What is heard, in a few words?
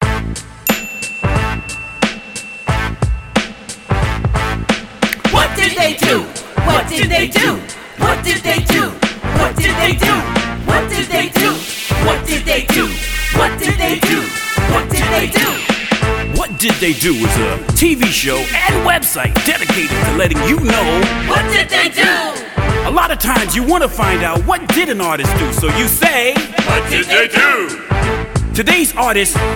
radio spot song